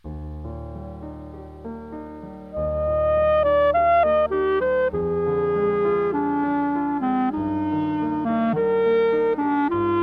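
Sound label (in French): brahms, clarinette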